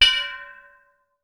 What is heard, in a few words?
synth bell; AKAI S6000 CD-ROM - Volume 5